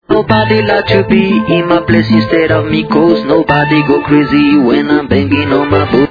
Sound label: bongobong